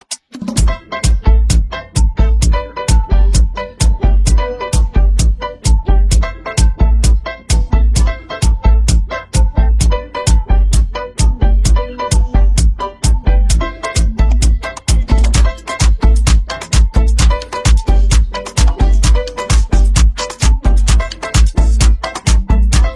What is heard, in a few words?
Instrumental